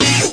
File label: disco stab punch; Punches Hits Discoblasts; single hits